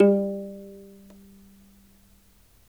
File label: HRP Afro Harp; Roland LCDP11; Africa VOL-1